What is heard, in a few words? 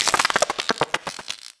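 scorpion die; DAoC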